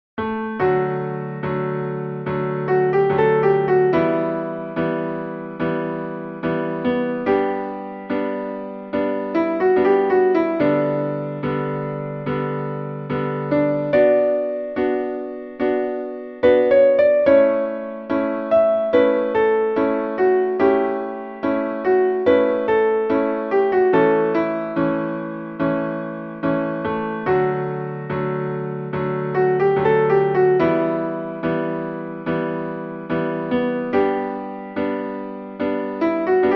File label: with chord